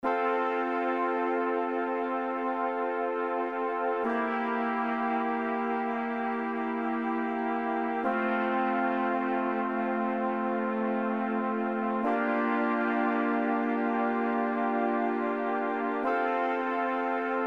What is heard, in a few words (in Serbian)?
akordi limeni duvaci dur